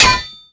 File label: connect iron